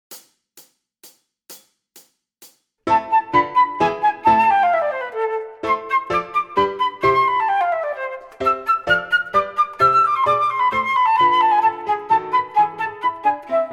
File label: Minuet Full Flute